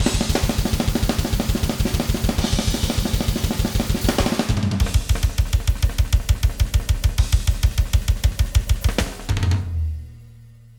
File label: poprock vs metalheads